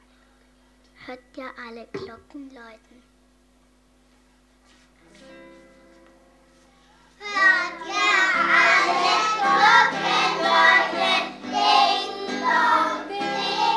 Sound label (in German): Hört ihr alle Glocken läuten, Kindergarten; Kindergarten Münstertal